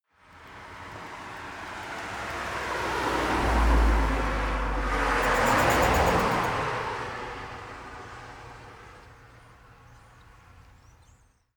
car double drive by opposite direction

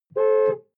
sports car int horn single; verkehr transport; autos

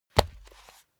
step barefoot wood parquet single hard; menschen; schritte

